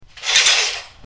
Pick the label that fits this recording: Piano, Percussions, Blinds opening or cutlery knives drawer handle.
Blinds opening